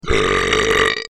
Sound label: brap; I belched that all out at once, one take, no breaks.